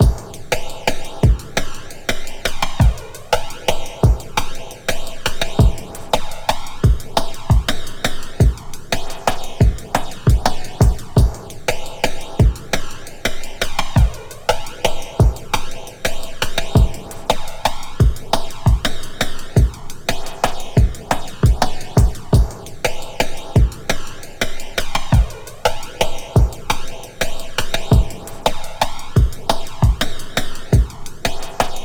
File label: 86 breaks; Fazer; Lockdown Breaks